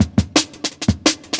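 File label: Jungle Breaks, 170 bpm; Frantic; Original Jungle Breaks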